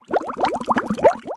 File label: splash sound